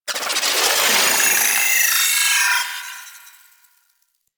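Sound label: Proto Infested Mutalist Projectile By; Warframe; Abilities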